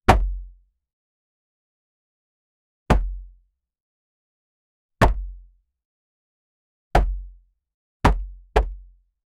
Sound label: hard kicks of hammer, woodworking